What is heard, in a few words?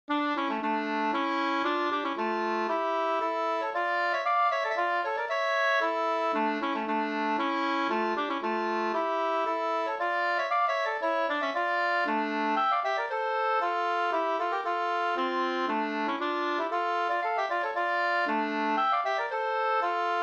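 polska, two-part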